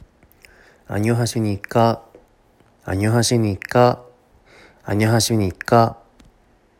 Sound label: aaaooo